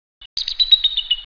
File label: Eagle